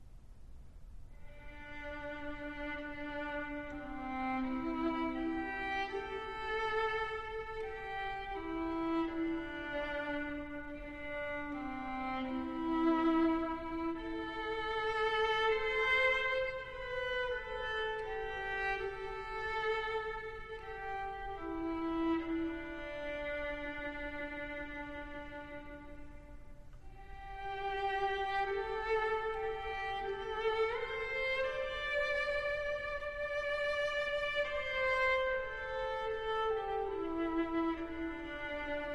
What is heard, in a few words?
Chorale Preludes; John Weaver